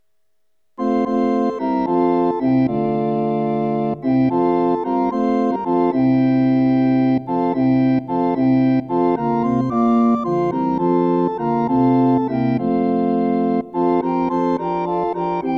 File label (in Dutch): Hymne, Canzone; Toen God in Bethlehem